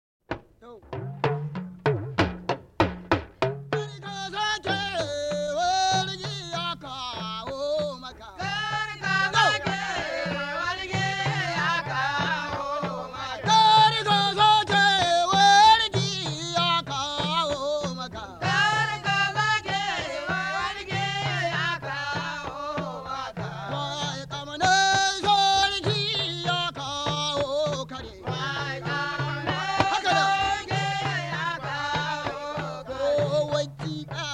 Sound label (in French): Musique traditionnelle africaine